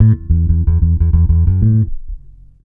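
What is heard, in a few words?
reggae bass A; Best Service Advanced Media Trax Modern Composer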